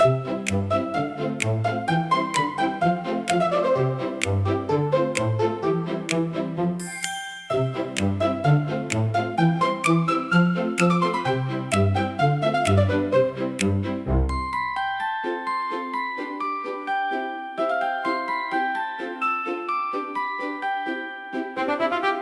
Bouncing Baton, comical orchestra for slapstick gag scene